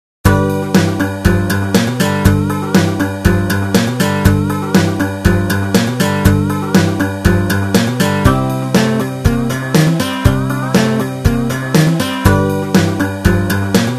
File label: folk song VST